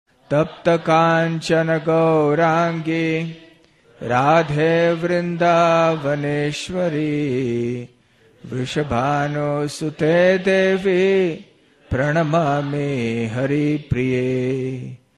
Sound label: Sri Radha Pranama Sung; Pranam Mantras